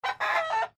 rooster bauk

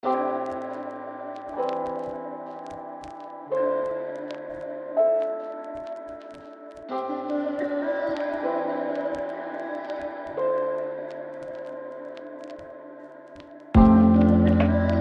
Lofi For Study

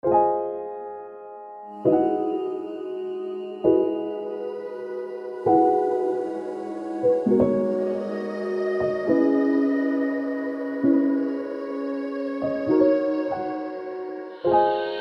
Lullaby

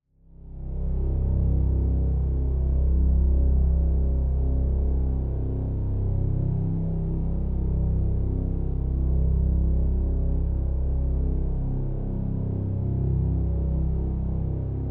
Drone Synth

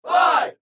Crowd

